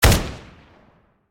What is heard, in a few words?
Akm Fire; Criminality